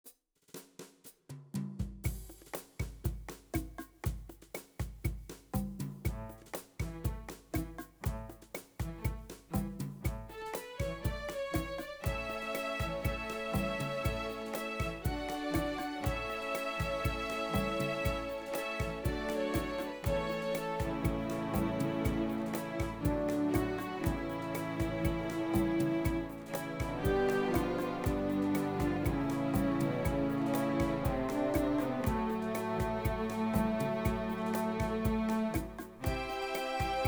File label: Rumba